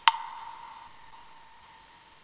Tock

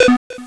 Multi Beep

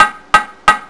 Knock

